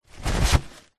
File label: hospital paper towel pull from wall dispenser single